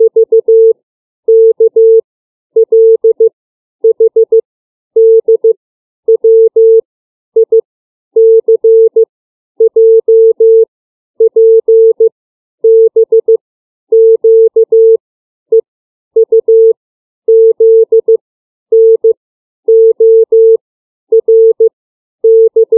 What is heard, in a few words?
CW alphabet